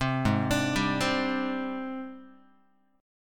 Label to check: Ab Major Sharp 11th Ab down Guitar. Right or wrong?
Right